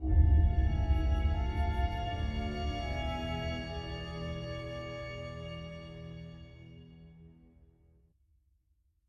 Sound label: Music Walk Dark Cave End; asterix obelix slap them all 2